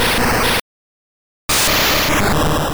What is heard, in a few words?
Tri Attack; sfx; attack moves; pokemon; gen 2; gsc